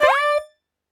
counter outro; astro Legends Lyra and Erion Desktop; Foxium

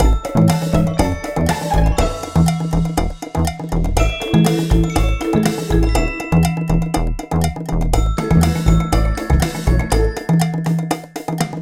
music free spins; PushGaming; Peter And The Lost Boys; peter Pan

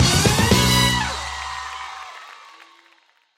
music big win outro; riviera Star Desktop; Fantasma

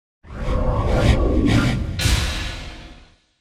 enter negative slow; medallion; Fantasma

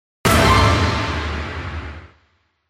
win explosion; medallion Desktop; Fantasma

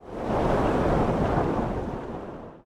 wind; adelia The Fortune Wielder; Foxium

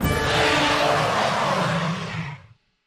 dragon boss death; Skillzgaming; battle Mania Desktop

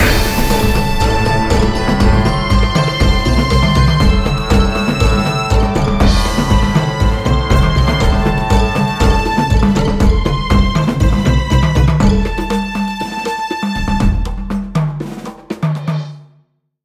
Win; Jason's Quest

